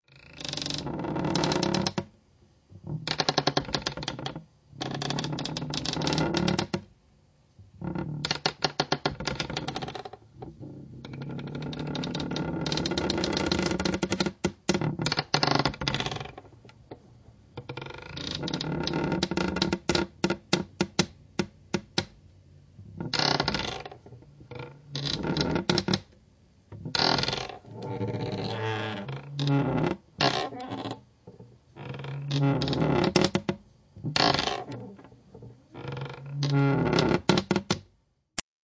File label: Track, Doors; 헐리웃특수효과음; 문 소리